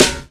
Sparkle Snare Drum Sample C Key, acoustic snare tuned to the C note